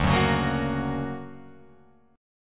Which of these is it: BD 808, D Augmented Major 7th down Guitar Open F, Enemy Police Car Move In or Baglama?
D Augmented Major 7th down Guitar Open F